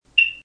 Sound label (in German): Batteriewarnung; Pyrexx